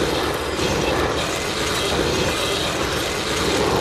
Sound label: zipline loop; Titanfall 2